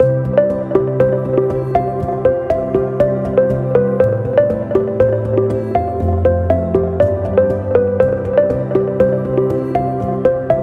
Ambient

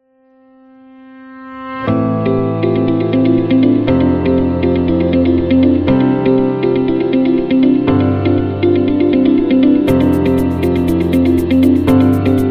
Corporate Sun, corporate music; corporate music vol 5

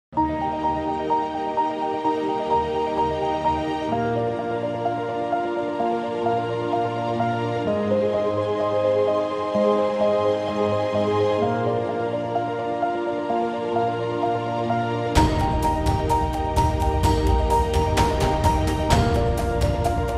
Motivate

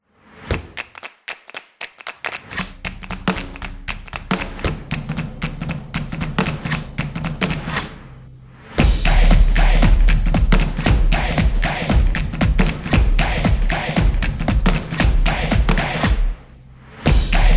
The Drumming Percussion

